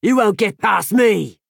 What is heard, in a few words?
attack; adoring fan